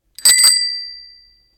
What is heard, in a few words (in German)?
Fahrradklingel; Motion